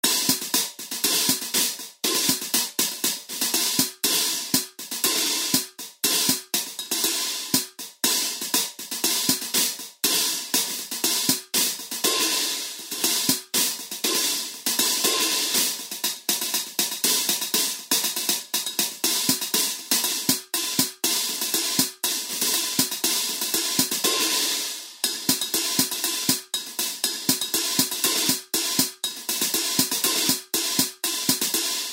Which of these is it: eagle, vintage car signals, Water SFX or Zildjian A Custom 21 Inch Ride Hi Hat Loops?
Zildjian A Custom 21 Inch Ride Hi Hat Loops